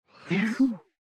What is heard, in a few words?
Cry; Venx